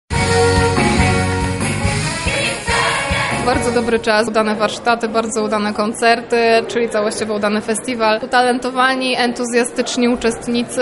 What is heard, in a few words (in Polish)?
Wiosna Gospel